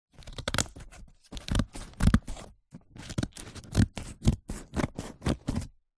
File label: office scissors cut cardboard